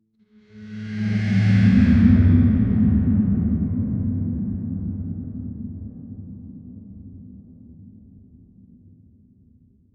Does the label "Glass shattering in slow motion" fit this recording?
No